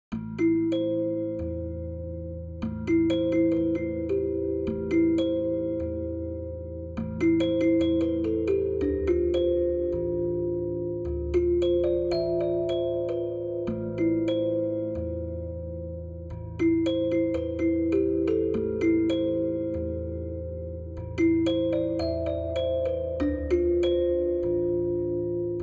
Moody dance, celeste; Niclas Fogwall; pianoteq8

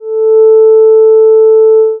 flute

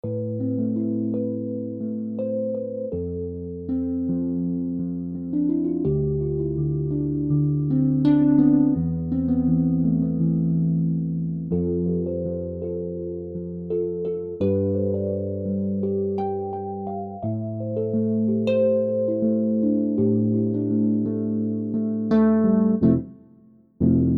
Electra, hohner; pianoteq8

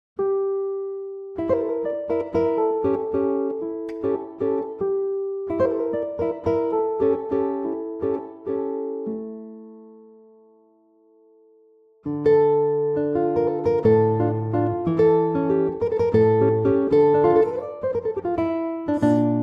vals, guitar; barrios; pianoteq8

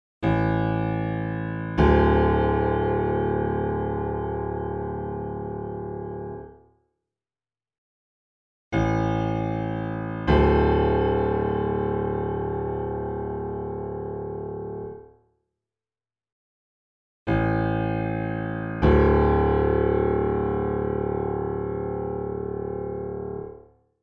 piano size

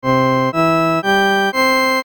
arp zarl

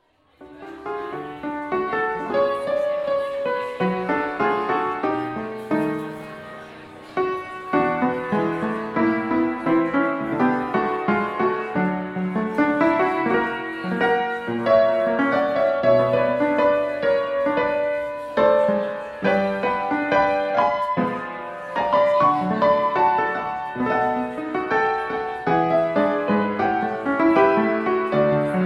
U4 Bierkeller, pianoteq

